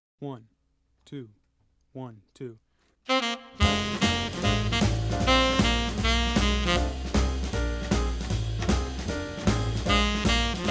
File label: Shufflin In F